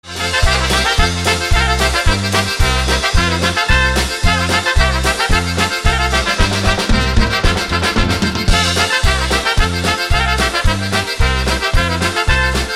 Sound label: Polka